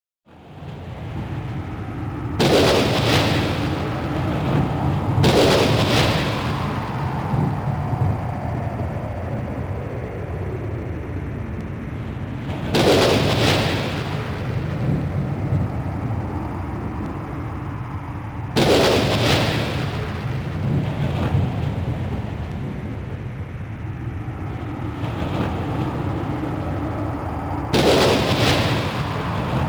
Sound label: The Gate (AMBIENT); King of Fighters XIII; PS3